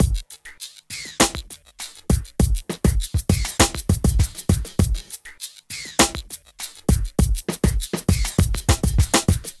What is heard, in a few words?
Smooth, R&B Drums; Sonic Foundry (Sony Creative Software)